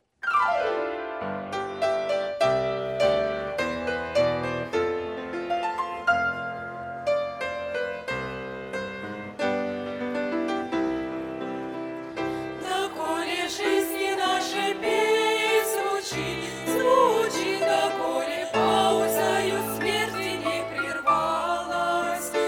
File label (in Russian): пение; 2016 Молодёжное общение, г.Курганинск